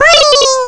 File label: huii; Jump Zampoli